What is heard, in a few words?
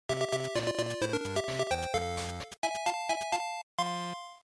General Clear, bgm